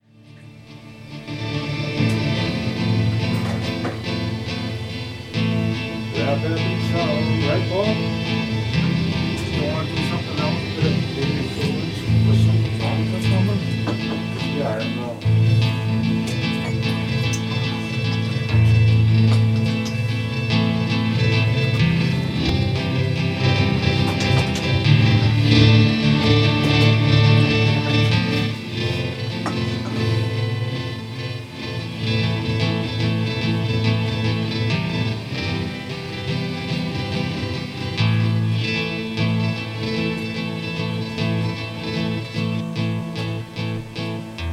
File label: Jam